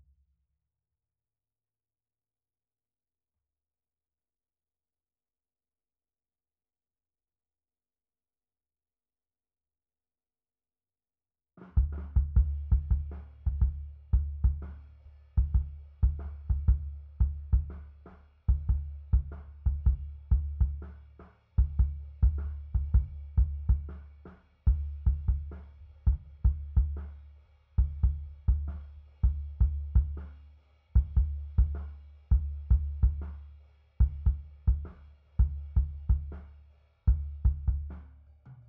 Kick SUB

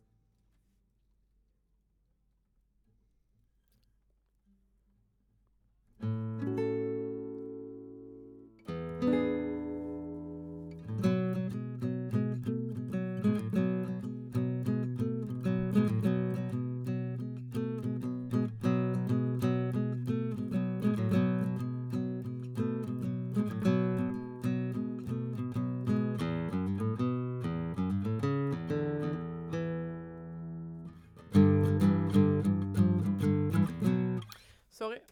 GTR

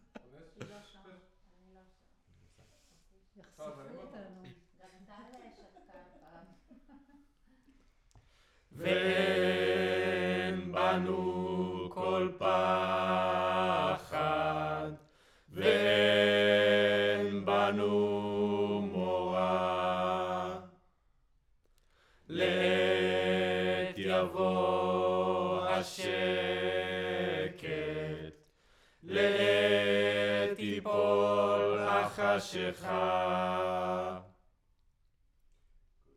Choir

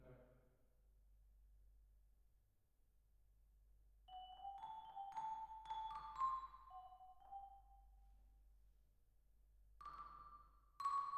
Vibra

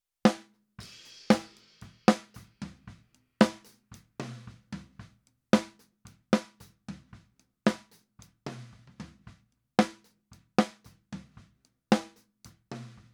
SNR

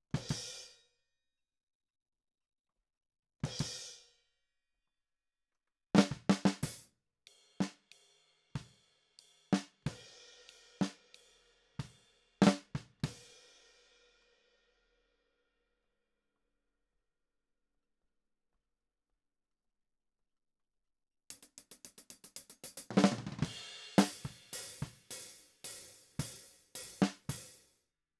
Snare

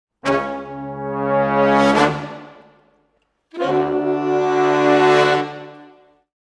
Brass, Jazz for piano

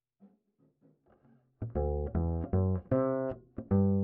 Bass Line, Ragtime

